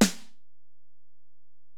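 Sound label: Acoustic Snare, Alesis DM-PRO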